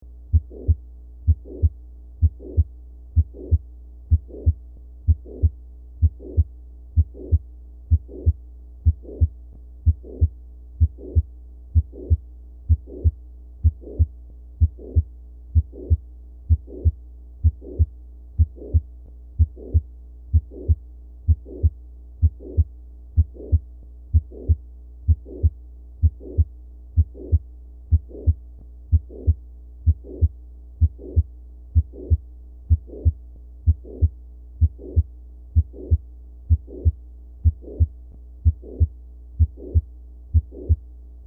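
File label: Apex, Late Systolic Murmur, Supine, Bell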